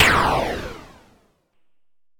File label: Blaster outgoing